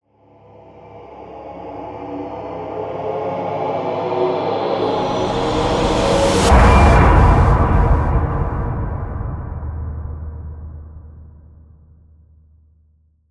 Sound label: Horror Composition, Zombie, Crescendo, Hit; Imaging Element; Accent; Transition; Production Element; BlastWave